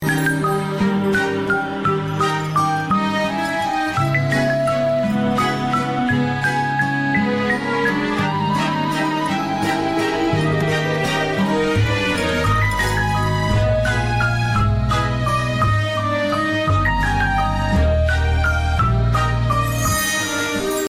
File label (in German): zirkus ringtone